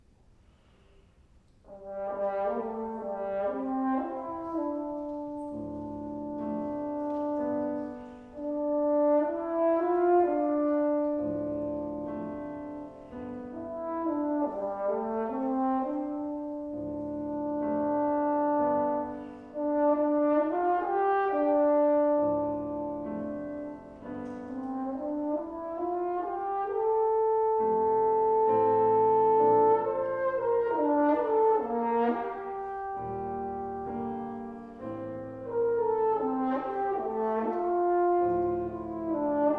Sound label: Horn Sonata